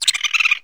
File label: penguin; tf2pets